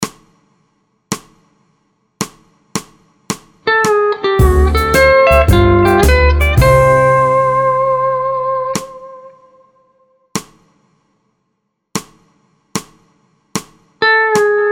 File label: Key C Vamp 4 4